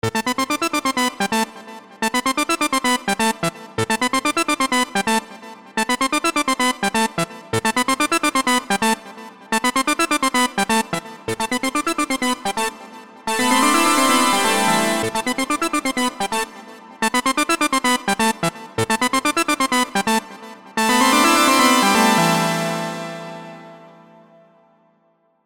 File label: LD OBX Analog Raw; Vengeance Sound Avenger 2; Melodic Tech Voices 1